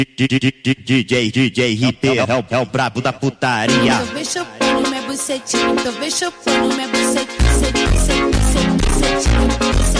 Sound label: song; metro man